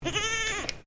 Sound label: Animal Goat Boer Kid Baa; Animals from Edgar's Mission farm in Victoria; Goat Sound Effects; Goats (Sound Effects:Animals); Foundation1